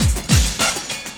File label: loop, 200 BPM; Drum & Bass; Ueberschall; Drum & Bass Resonance